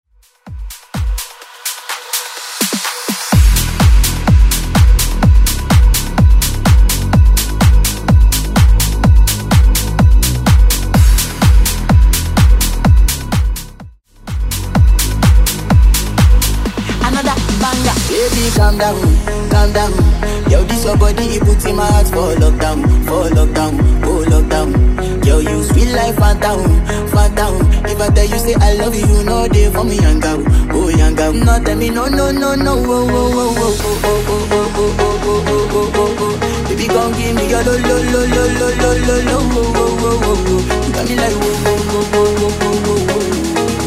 Remix 126BPM

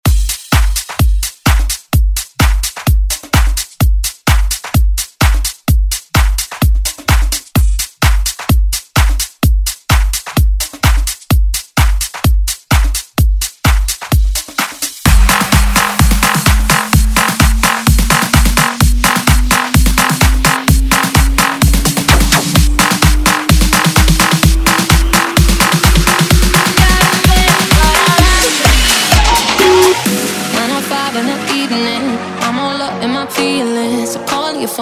Bed Dance Bootleg Clean

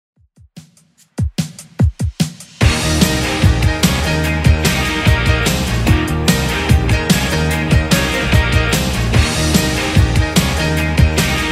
80s 147 bpm; Redrum

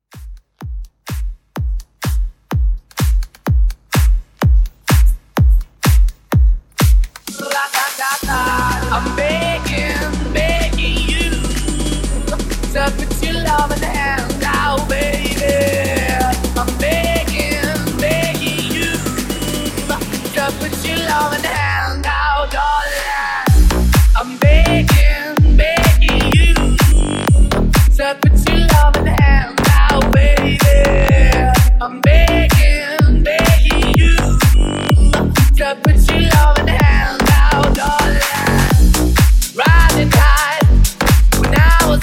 Club Mix

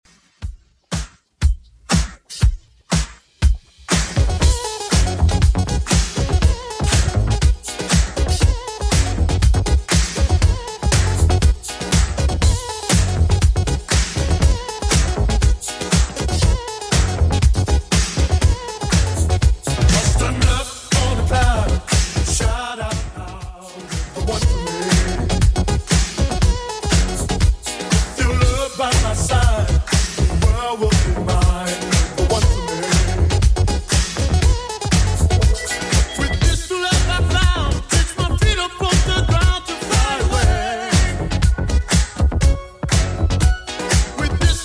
80s Redrum 120bpm

